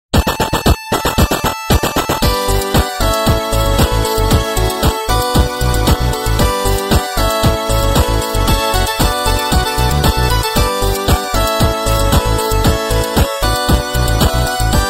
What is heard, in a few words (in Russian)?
8-bit muzyka na sotovyi telefon ringtony